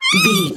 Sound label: voice fun mouse